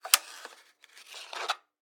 X-Ray Machine; Medical; Blastwave

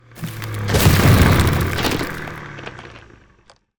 Ice Spell Whoosh, Cold Whooshes; Arctic Aura; Motorice

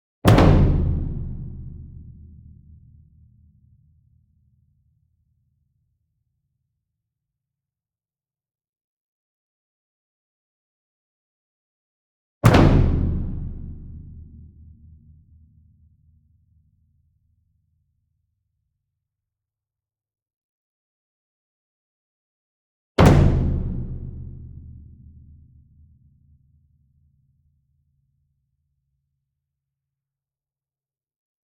ENSEMBLE DRUM Bass Drum Concert Flam Medium; boom library; Cinematic Strikes Construction Kit

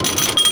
Menu Mechanical; Menu FX

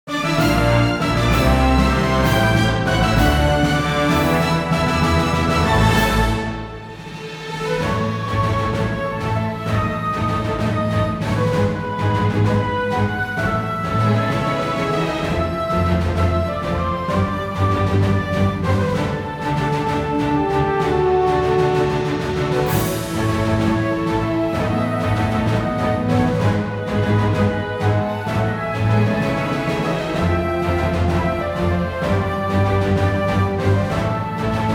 Victory Fanfare; Kilta

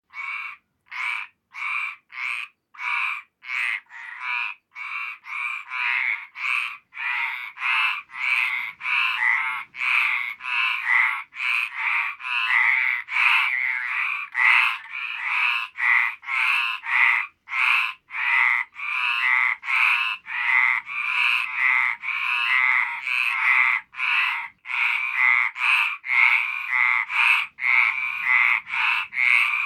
frogs dry; animals; best service Blue Box

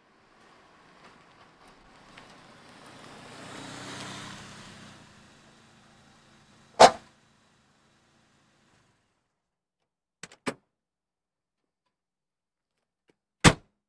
Automobiles various

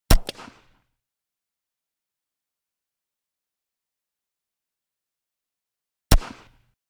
.22 Pistol Blast Close; Hollywood Edge; Heavily Armed

